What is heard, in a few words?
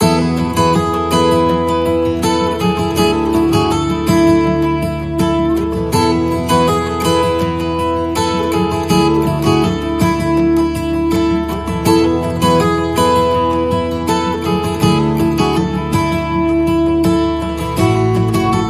Positive Forward, No Drums